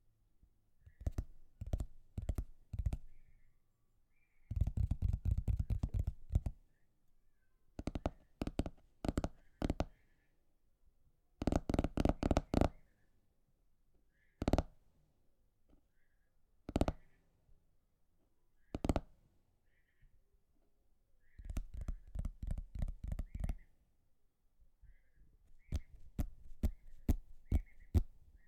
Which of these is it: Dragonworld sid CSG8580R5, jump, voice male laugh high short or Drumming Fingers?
Drumming Fingers